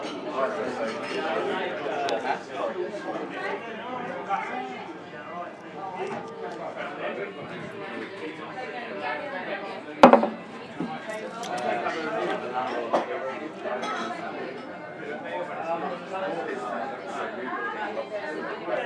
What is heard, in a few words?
pub